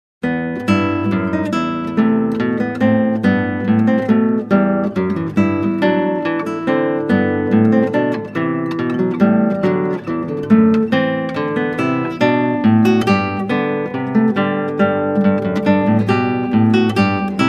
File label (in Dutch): Klassieke Gitaar; Bach 50 Solos